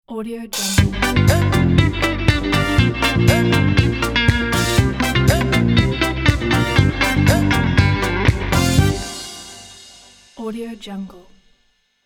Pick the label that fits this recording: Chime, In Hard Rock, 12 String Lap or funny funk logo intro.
funny funk logo intro